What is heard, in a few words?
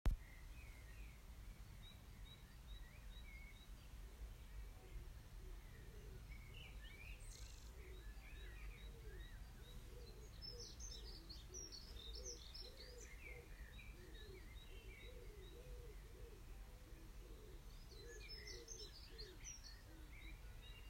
dawn chorus